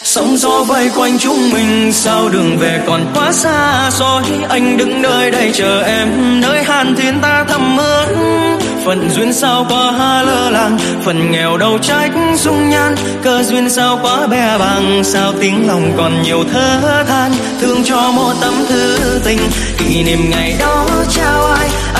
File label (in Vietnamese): Nhac Chuong Remix